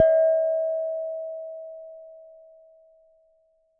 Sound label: Struck Vase